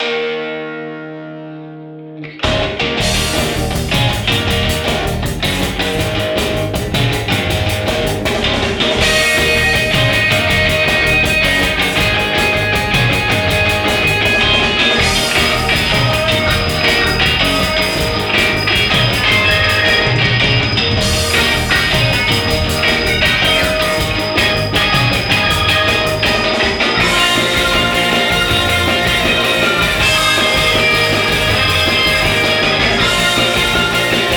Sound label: garage rock